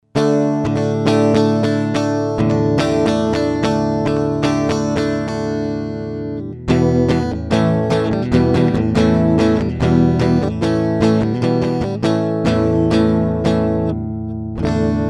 Golden Age Project Pre 73 Jr MKII Gtr Gain 60 Out min Air 0 HPF 0